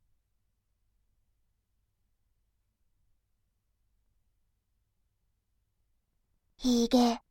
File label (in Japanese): ひげ, ASMR, バイノーラル右